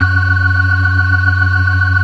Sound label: FAST VIB, ORGAN, TREMOLO VIB